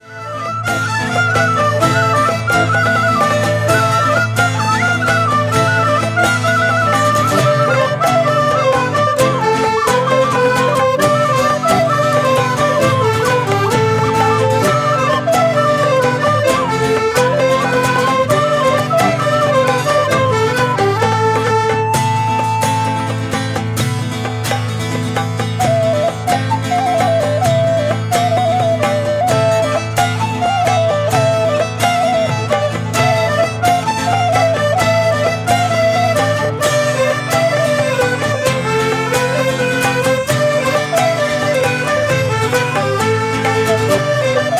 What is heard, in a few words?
Bourree; Bourree De Marrakech